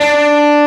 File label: arp; osu